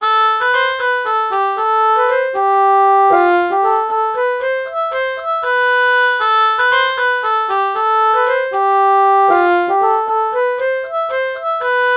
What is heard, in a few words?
oboe bassoon melody morph